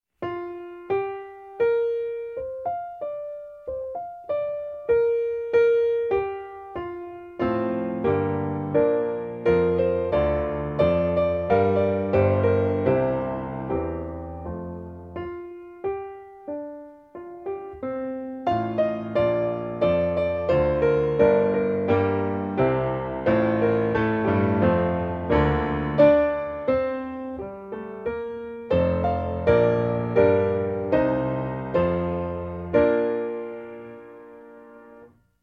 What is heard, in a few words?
piano shell be coming; performance today